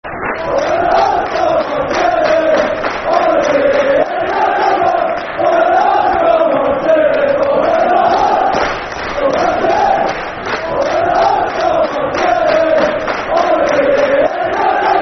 When The Hearts Go Marching In Football Chant (Soccer Song); Heart Of Midlothian, Hearts Hfc